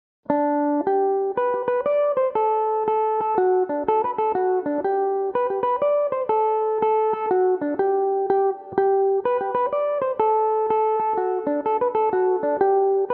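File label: gan ainm slide G major, irish mandolin; Belmuse EM-190 electric mandolin; Gan Ainm 17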